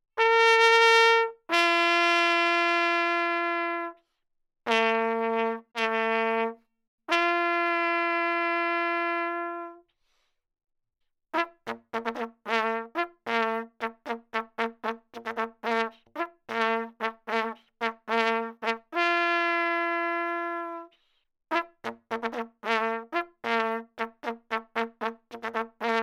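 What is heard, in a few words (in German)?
Begrüßung, Allgemeine Signale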